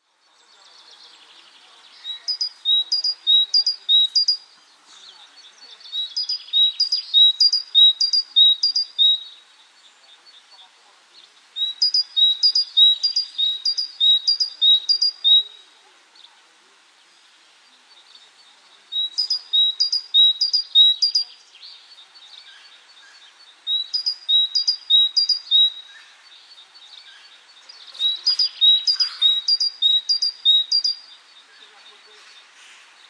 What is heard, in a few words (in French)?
Mesange charboniere; CapioVox